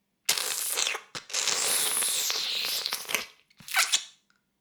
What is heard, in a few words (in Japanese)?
フェラ音声; 牧場戦腺; 少尉